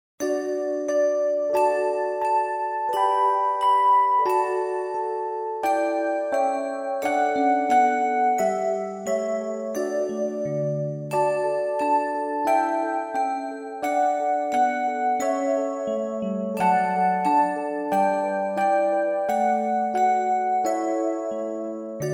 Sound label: twinkle twinkle little star